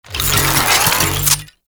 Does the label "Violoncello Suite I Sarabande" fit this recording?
No